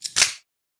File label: gun loading; soundscrate